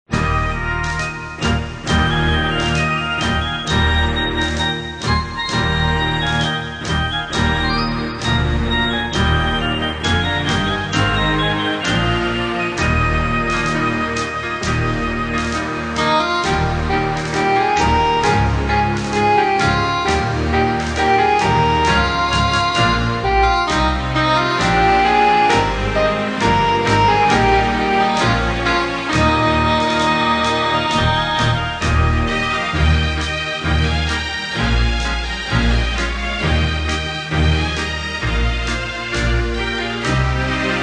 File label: Orchestra, Strings